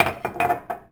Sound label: Coffee Cup Drop; SFX Kit; Foley - Misc